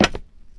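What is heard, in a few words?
player metal, footsteps; csgom; cstrike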